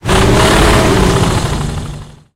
panzer3 engine load, tanks; engines; War Thunder

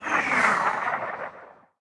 combat atmosphere; World of Tanks

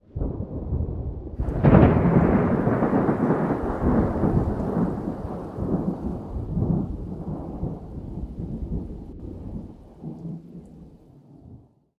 ambient battle; World Of Warships

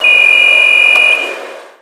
alarm metal detector; ModernWarfare

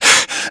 breathing hurt; Call of Duty Modern Warfare

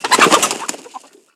animal chicken disturbed; Call of Duty Modern Warfare

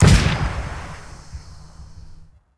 tarantula fire

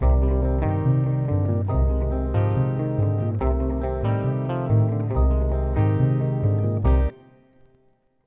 Spy Theme; Civ4 warlords

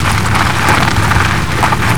surface tire dirt rocky loop, vehicles; Call of Duty Modern Warfare

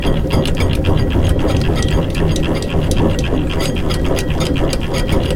turret turn loop manual, tanks; War Thunder

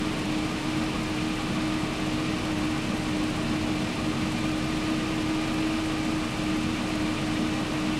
maus engine rpm; War Thunder

